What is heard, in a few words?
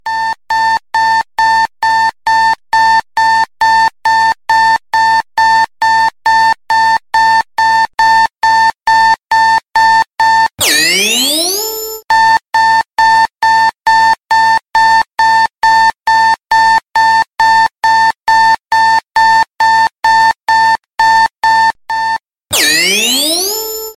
CLASSIC CLOCK ALARM ALARM